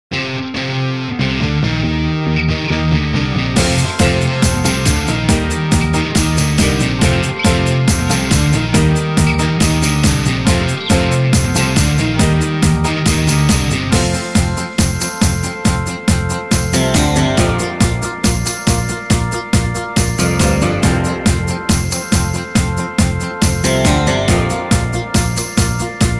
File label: brass minus